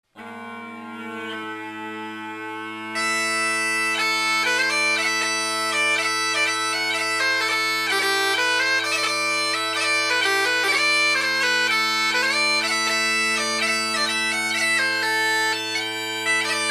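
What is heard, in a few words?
halting march, Bagpipe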